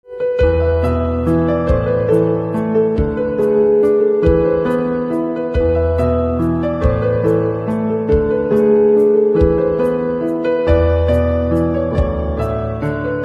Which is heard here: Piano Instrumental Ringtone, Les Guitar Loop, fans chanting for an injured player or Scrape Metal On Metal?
Piano Instrumental Ringtone